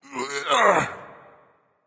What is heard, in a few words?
grunt lift; Smite; cabrakan